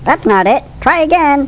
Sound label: 3 Scale Down; How Many Bugs in a Box